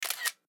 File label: screenshot iphone sound